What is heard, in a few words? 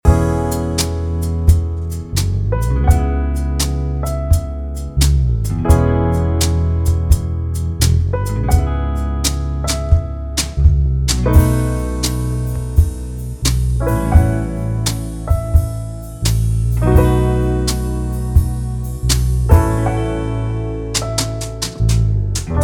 luxury jazz loop